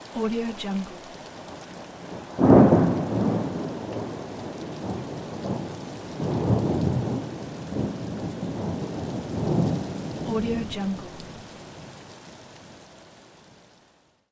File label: rain with thunder